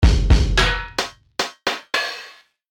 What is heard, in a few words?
Dirty Kick Snare Hat Fill 110 BPM; tribe percussions